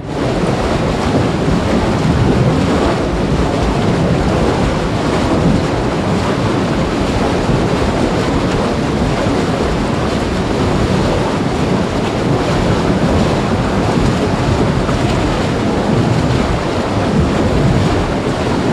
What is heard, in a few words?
submarines engines; World Of Warships